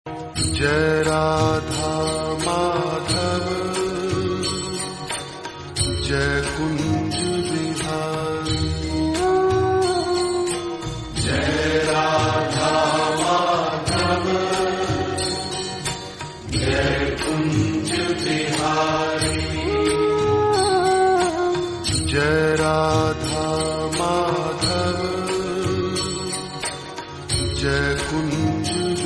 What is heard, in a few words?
devotional